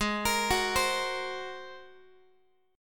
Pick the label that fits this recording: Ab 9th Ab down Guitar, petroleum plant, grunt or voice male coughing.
Ab 9th Ab down Guitar